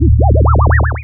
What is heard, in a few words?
boss alert